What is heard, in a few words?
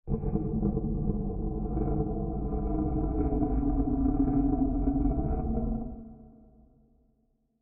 Ambient; Barotrauma; Subsurface